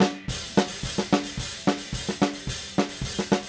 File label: Snare No Gate